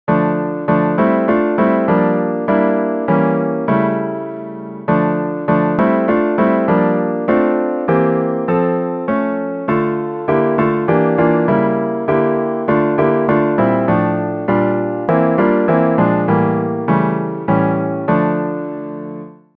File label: instrumental